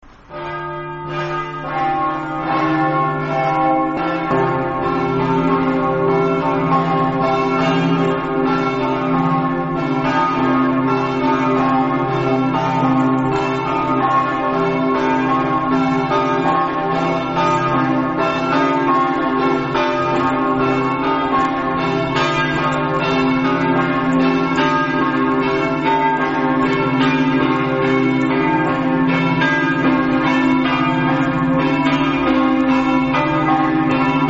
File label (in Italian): campane